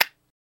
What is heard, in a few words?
Zippo Lighter Close; lighters & matches